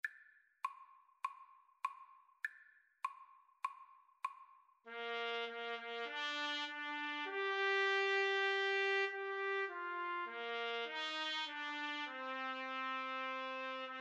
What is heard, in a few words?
crown him trumpet duet